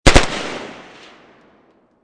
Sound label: AK47 2 Burst Bridge; Machine Gun; Machine Guns; VRSonic